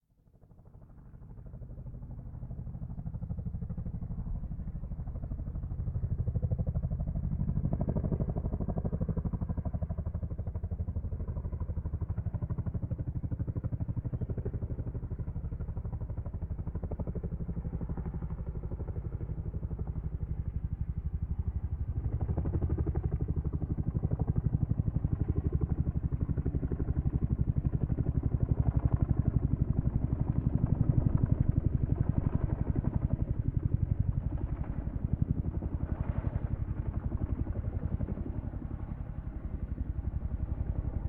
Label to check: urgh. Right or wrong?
Wrong